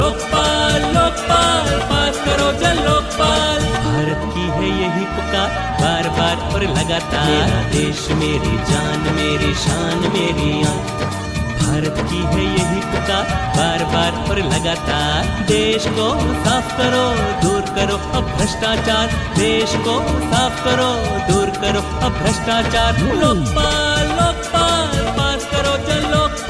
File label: Ring Tone; Jan Lokpal